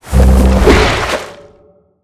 attack; bendy

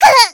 pain sharp; voice packs

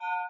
hit purple; zombie riot; btd

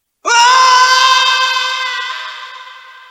death; potry v2; shulk

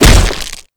hit; chriswalker; slender

